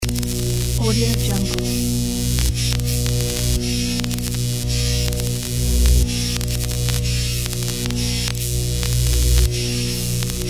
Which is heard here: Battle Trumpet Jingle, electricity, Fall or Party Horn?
electricity